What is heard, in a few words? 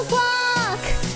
quack